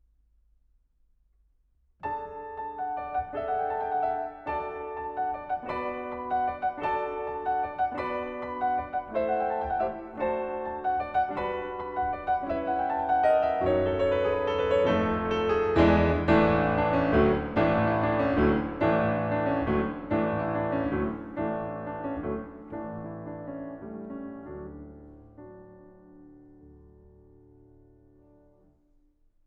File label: Norwegian Mountain Melodies, Leaping Dance from Vinje, Piano; EG 108 III.; Grieg Complete Piano Works